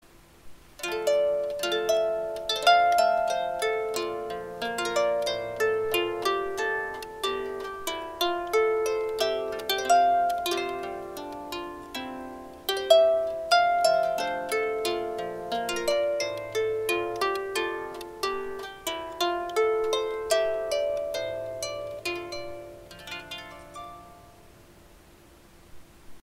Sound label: Wind Scene, harpist